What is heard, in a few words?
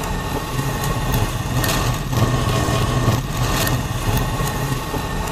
Board Wood Scraping; Phasmophobia